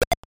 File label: Koopa Shell; super mario bros. 3 1988 nes